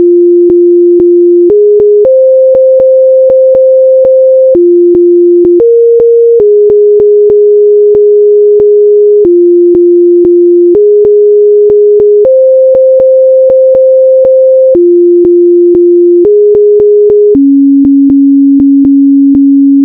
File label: resonance